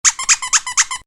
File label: Squeak, Squeezy Dog Toy; Miscellaneous Toy; Toys; Stuart Duffield